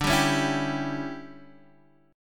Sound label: D Major 7th Suspended 2nd Suspended 4th, D, down, Guitar Open D